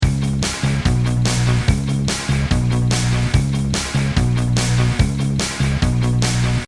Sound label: backing